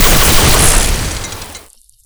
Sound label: retch fire; Quake 4 Alpha; id software; strogg